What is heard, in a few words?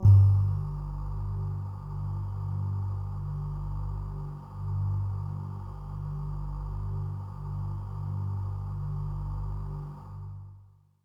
Bell Air Kawaii G-1